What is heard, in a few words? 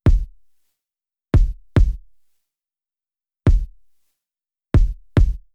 Kick No Dist